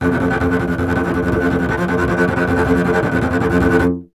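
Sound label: string contrabass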